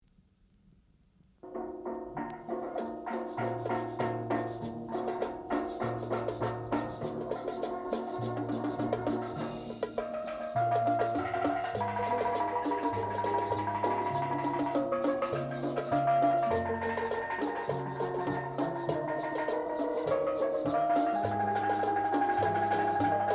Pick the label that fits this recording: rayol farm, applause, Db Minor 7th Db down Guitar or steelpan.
steelpan